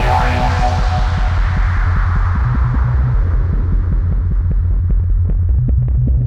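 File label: Dark Star, Hardcore Breakbeat, 153, F minor; Big Fish Audio; Hardcore Breakbeat Action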